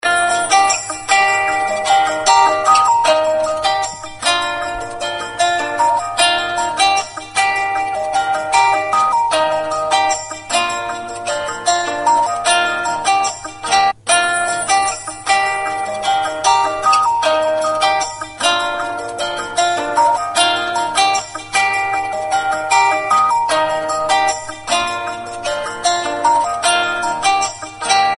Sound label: lg stylo